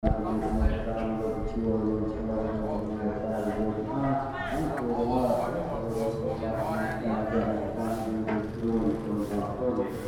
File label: voice monk like chanting; 2019; Cambodia phnom penh